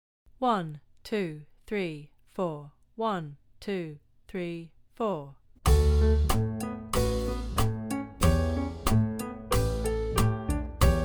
Cha Cha Cha pizz, violin

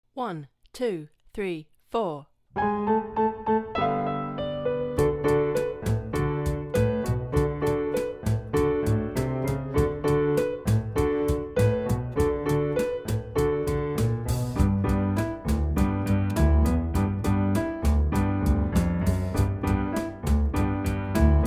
Rocking 2nds backing

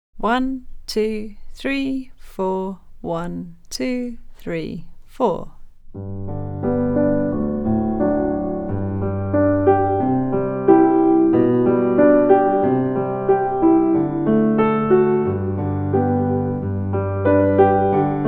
G major scale backing

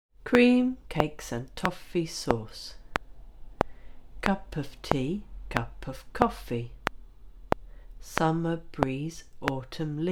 Word Rhythms

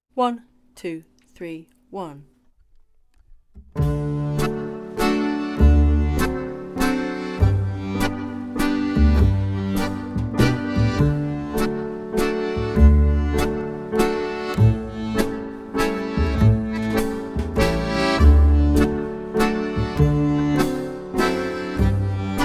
Bluegrass Waltz backing

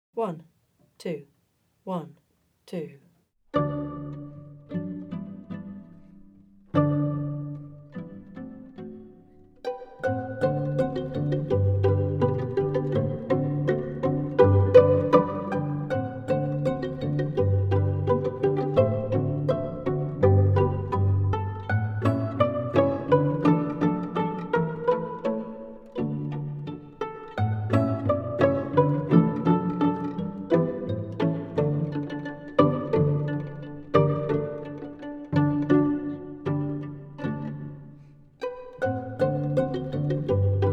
Pizzicato Polka backing

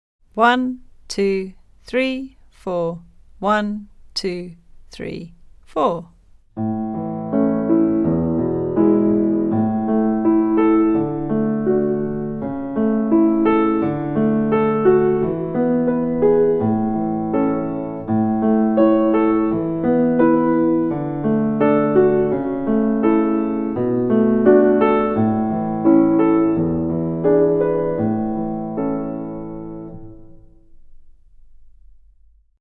A major scale backing

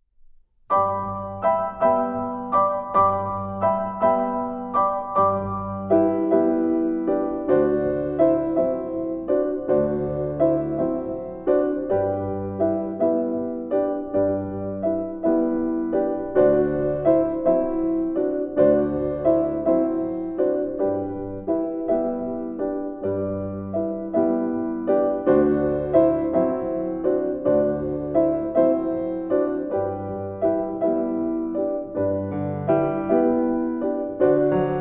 Barcarolle backing

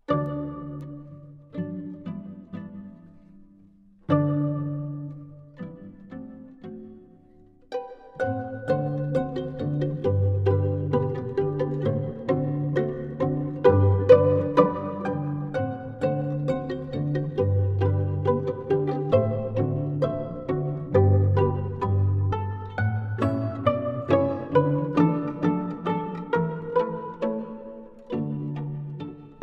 Pizzicato Polka backing slow

